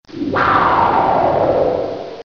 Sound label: Whoosh